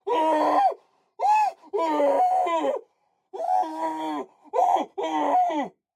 Scream, Male, Mid Thirties, Mouth Covered, Gasps, Fast, Shriek, Panic; Human